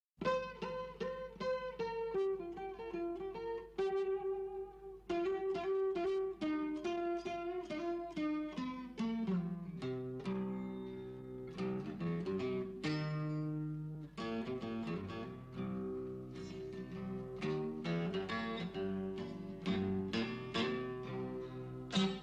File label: acoustic